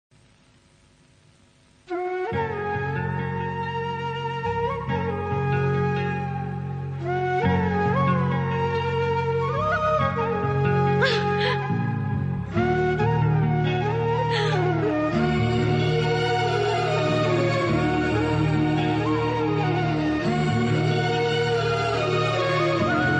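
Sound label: emotional flute background music ringtone; murari movie; mahesh babu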